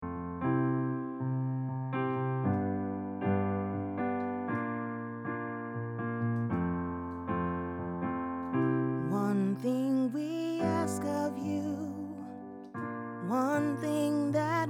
Full Choir; summer choir

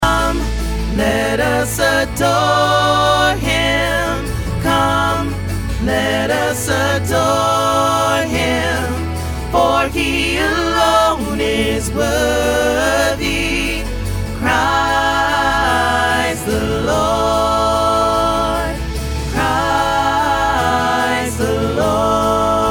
Behold Ending Full Choir

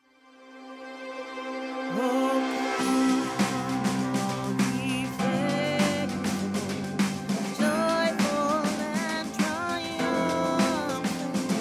Soprano w Lead; Soprano Parts; christmas choir

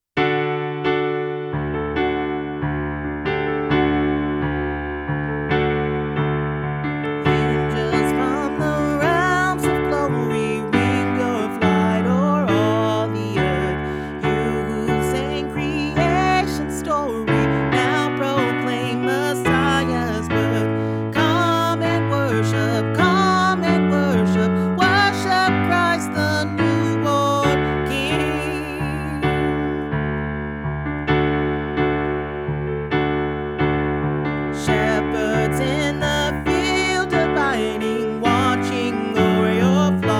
Emmanuel Full Choir; christmas choir

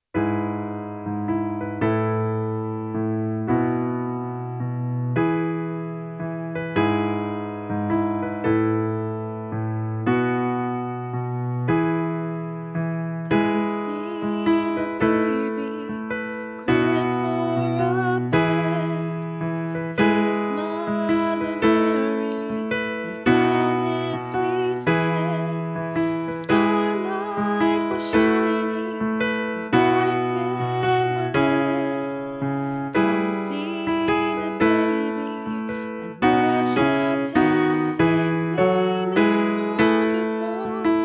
Tenor w Lead, christmas choir

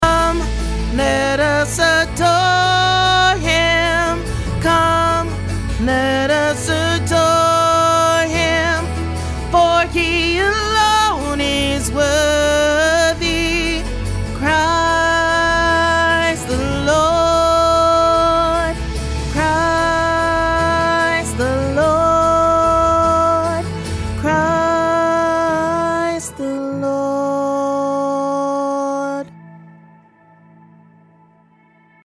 Ending Alto; Alto Parts; christmas choir

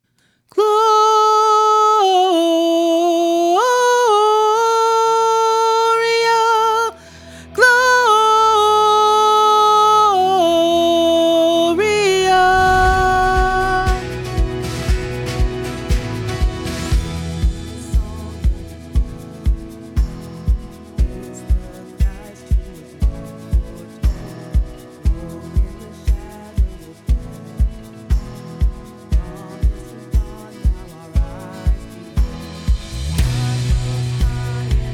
Soprano w Lead, christmas choir

